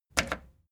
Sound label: record player play click fast